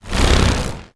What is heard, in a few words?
small attack; demons; marauder